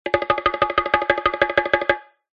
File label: cartoon running take off bongos classic; zapsplat